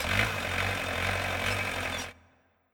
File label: Fan Start